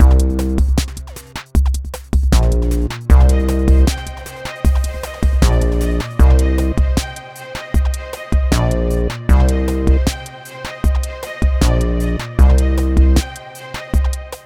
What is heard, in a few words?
bgm title; Nickelodeon Basketball Stars 3; ThrillGames